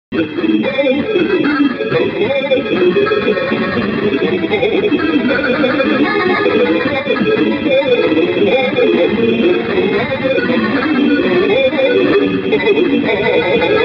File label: Radio; Milk outside a bag of milk outside a bag of milk